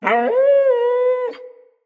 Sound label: Wolf Cub Trapped Call Far; Animals; Wolf Cubs; Orengal Wolf Cub C